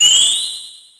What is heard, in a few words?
spell cast; ralsei